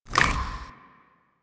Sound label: Infested K Drive Jump Ready, Vehicles